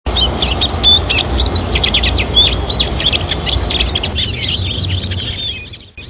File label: spinus spinus